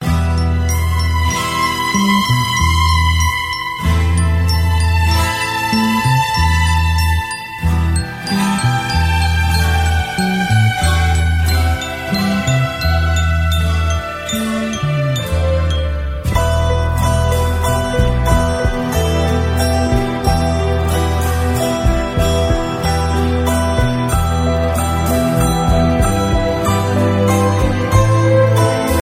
instrumental ringtone

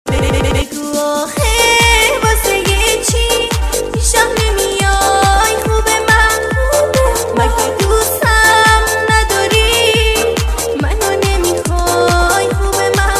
arabic disco ringtone

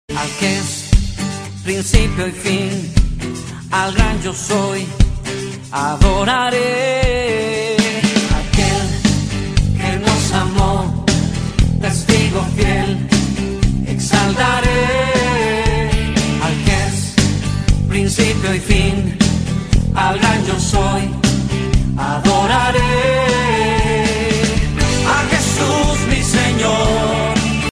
spanish ringtone

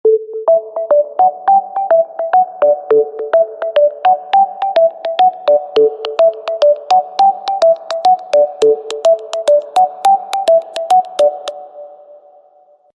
bloom ringtone; nokia

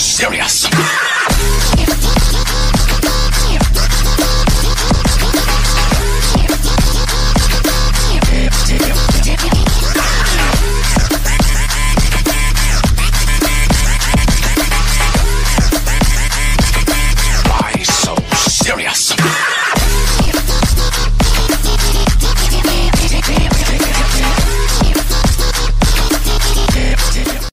english sweet music

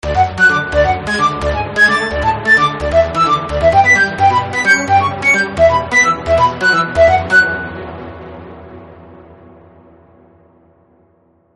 funny flute music

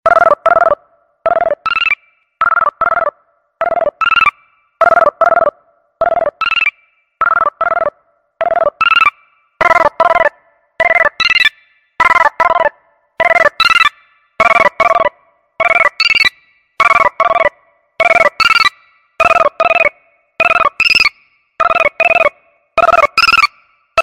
galaxy beeper ringtone

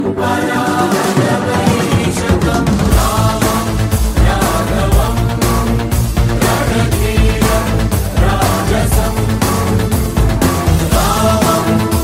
hindi ringtone; bollywood ringtones

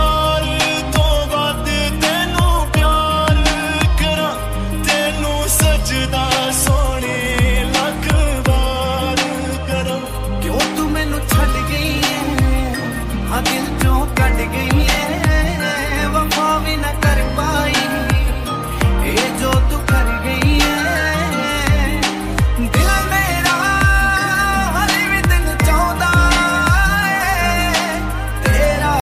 remix ringtone; english ringtones

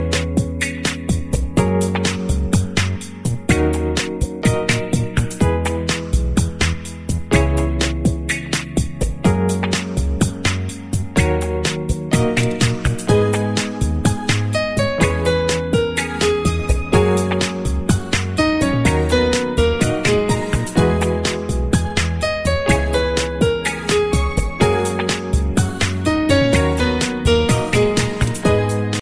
melodious ringtone

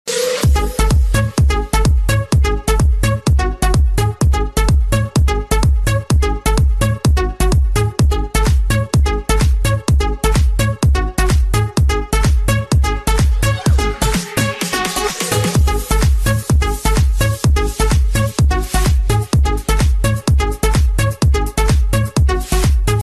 hard trance bass, ringtone

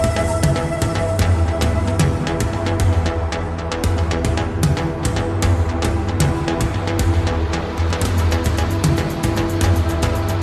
drum

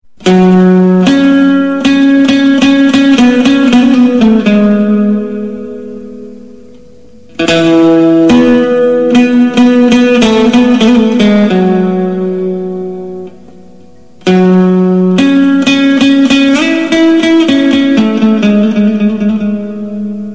piano ringtone

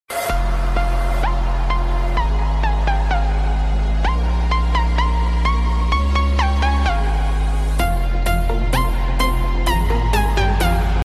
bell electro ringtone

